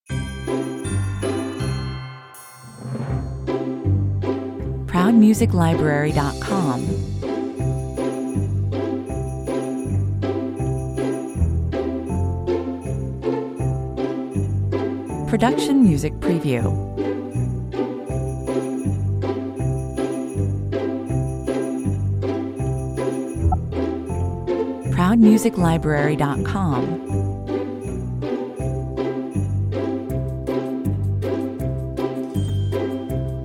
jingle bells (no melody, no drum kit)

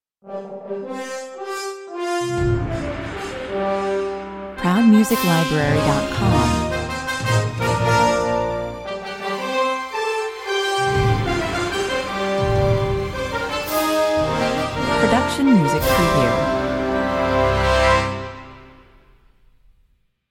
fanfare

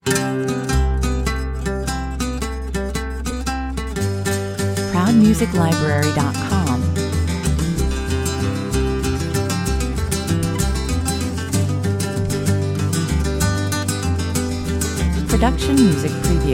mariachi guitars

